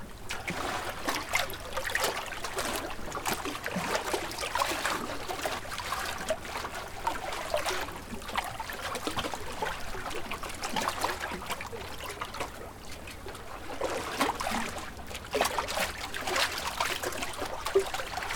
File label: water calm; KriptoFX; WaterSystem